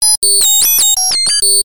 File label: science fiction computer glitch or malfunction